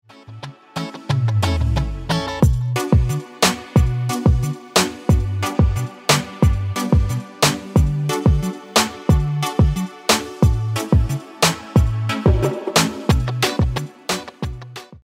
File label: reggae